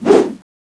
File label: stab, weapons